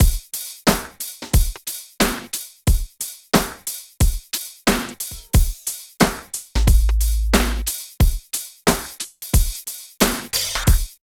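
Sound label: LOOP, right; East West - Phat & Phunky CD2; 90's Sample CDs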